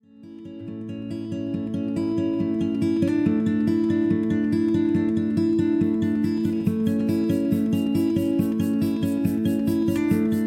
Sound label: makes ghost noises